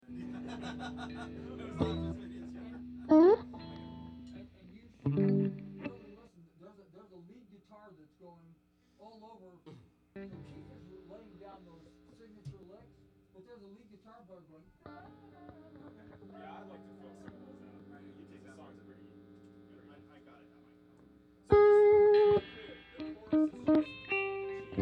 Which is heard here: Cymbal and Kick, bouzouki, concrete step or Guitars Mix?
Guitars Mix